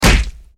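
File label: hard palm slap on the cheek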